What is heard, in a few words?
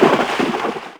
water splash small; WAR